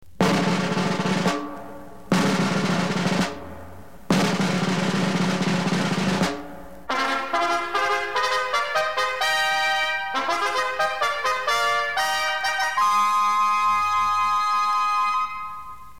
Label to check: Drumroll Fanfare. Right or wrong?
Right